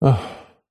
human male sigh satisfied exhale

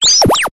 science fiction robot small cute chirp

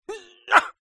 human fight karate vocalisation male

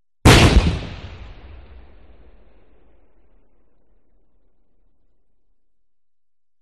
Explosion Small; audio hero

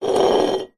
food drink can soda empty slide short table

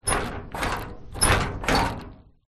office filing cabinet old metal handle turn

